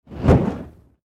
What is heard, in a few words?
Burn Sound